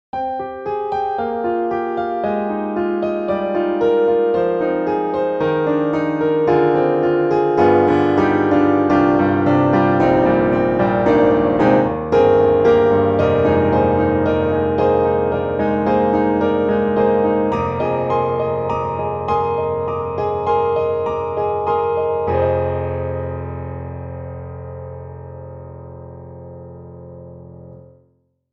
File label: Pianoteq Grand C2 chamber; MODARTT S.A.S.